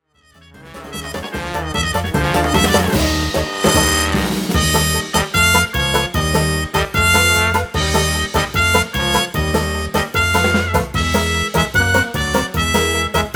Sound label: News Report Music